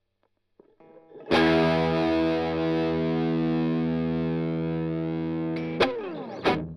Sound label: Strum Line